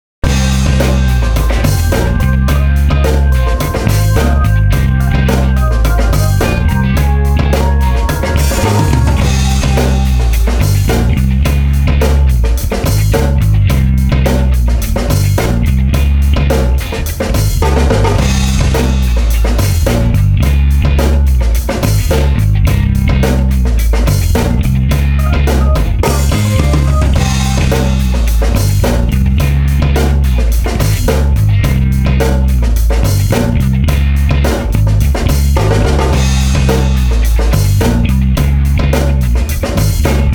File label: ringo karaoke